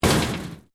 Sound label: Metal Plate Impact; Material components to create more complex sounds; Materials